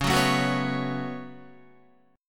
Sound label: Db 7th Suspended 4th Sharp 5th Guitar; strummed; chordshape; JGuitar